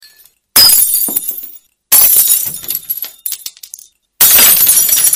glass sms; ringtones

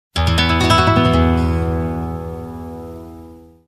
short guitar sms; ringtones

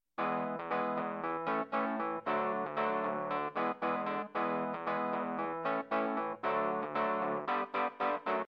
On Keyboard

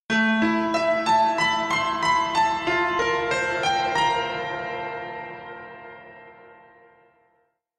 Piano Reverb

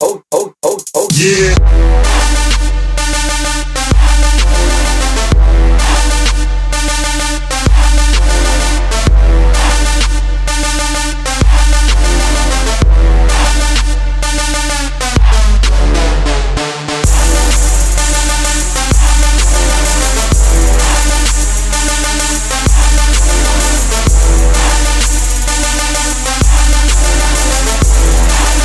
Trap

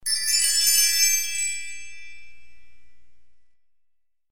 synthesizer bells; alternative

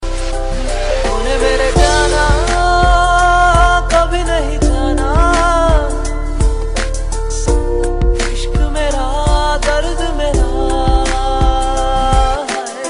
voice tune

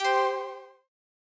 Sleek Chord Alert

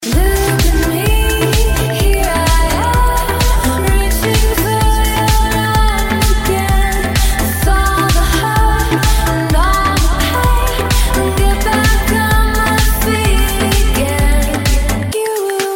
trance sing, ringtones

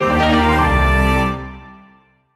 Good Chime

